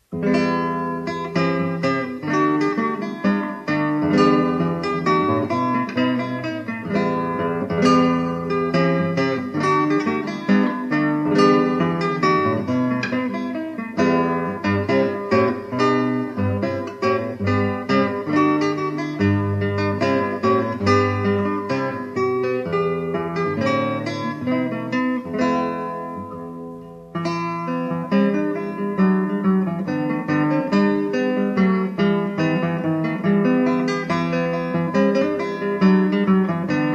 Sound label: guitar; pastime with good company